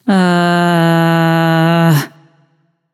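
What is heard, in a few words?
nonverbal seriously; smite; voicepack; izanami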